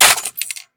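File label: rifle drop glass; STALKER; IWP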